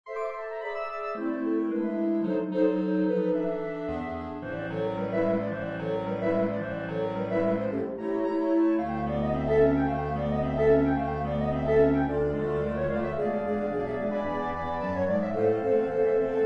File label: Clarinet; Gershwin; Rialto Ripples